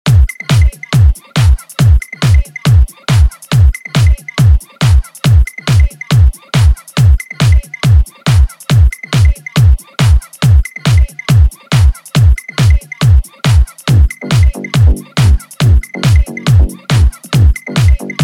eletro car automotivo

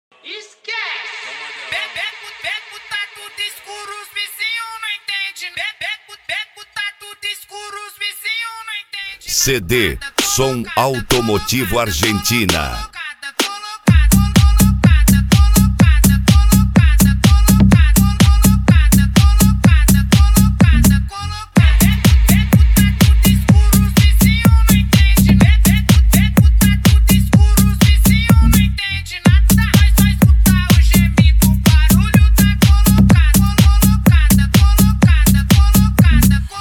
som automotivo

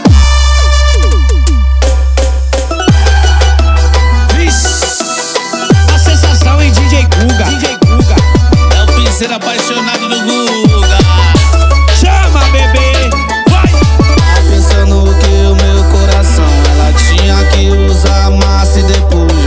piseiro

